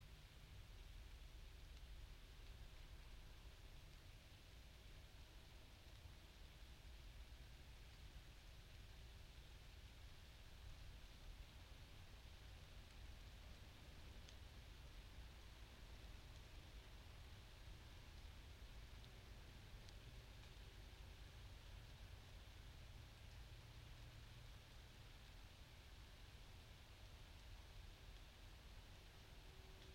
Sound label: yamanakako sound